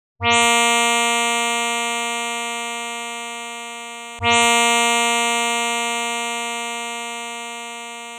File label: sa 233 string, tanpura; tuner